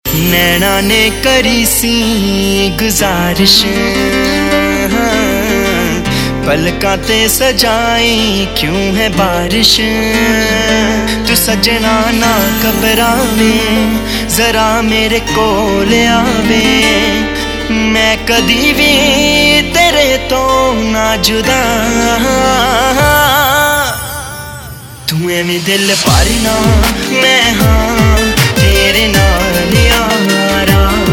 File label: Ringtone, Punjabi